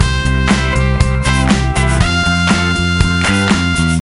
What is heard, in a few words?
Flourish; gamerip; Happy Feet Two; nds